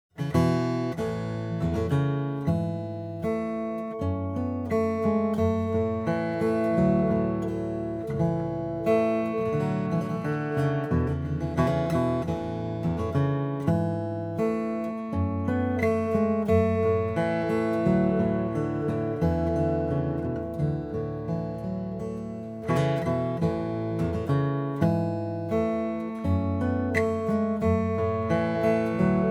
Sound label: Baritone GTR